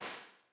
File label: C12 Omni, Impulse responses; Henry Olonga; The Beautiful Room